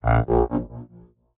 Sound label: Trombone; Sx Special-Photo Impossible-Triangle Trombone 01